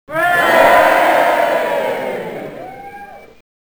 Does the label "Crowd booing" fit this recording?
Yes